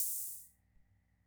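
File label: Keynote MR-101 Auto Rhythm open hi-hat; Keynote MR-101 Auto Rhythm Sample Pack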